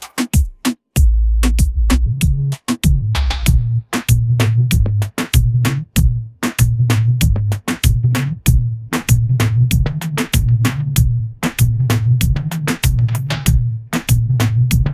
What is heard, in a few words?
tropical dembow groove, ringtones; ai